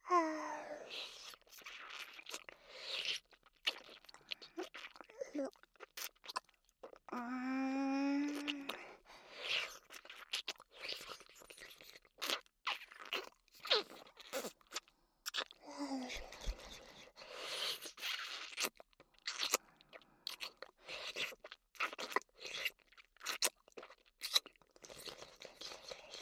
ディープキス音

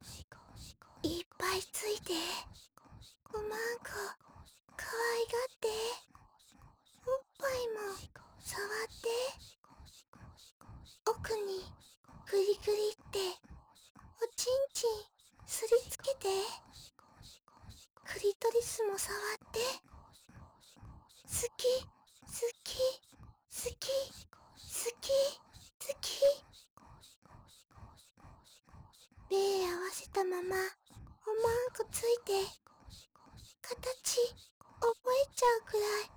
シコシコ アッアッ セリフ 普通

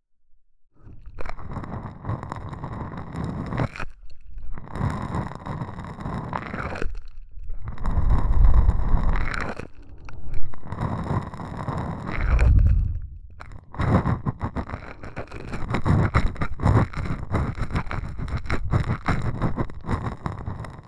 耳舐め

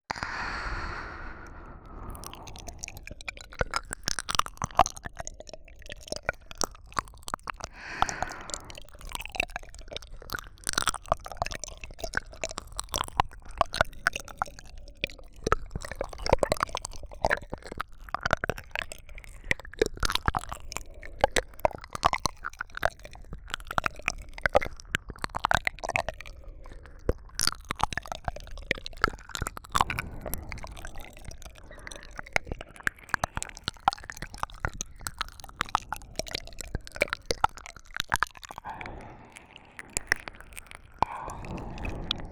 両耳舐めリピート, ASMR

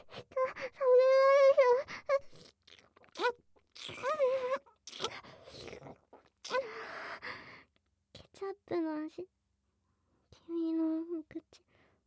べろちゅー

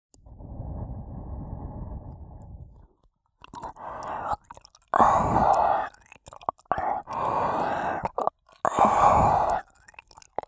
仕上げのおそうじ耳舐め♪

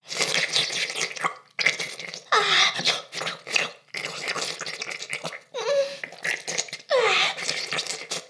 フェラ音声, ASMR; ダメなメイド

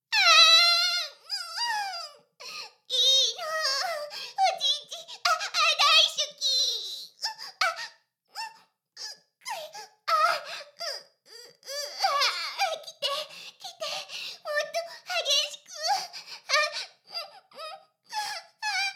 Ｈな音声; ダメなメイド

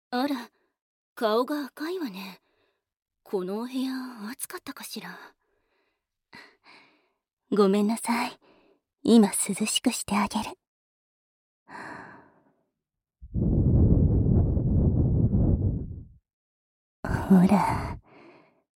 お姉さん 耳 ふ～

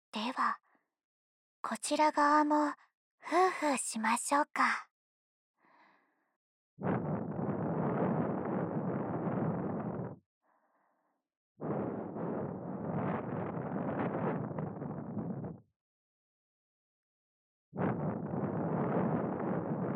耳吹き、右耳; おまけ。後日談

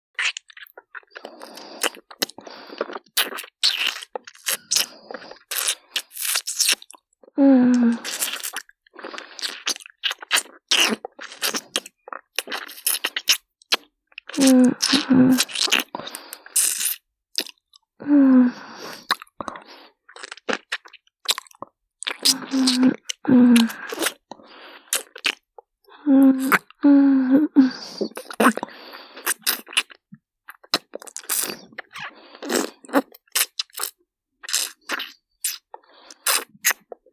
舌絡め, ASMR; キス

